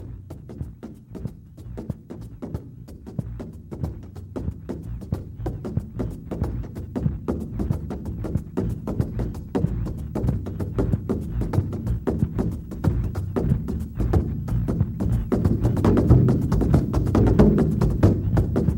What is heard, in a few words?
Heartbeat Drumbeat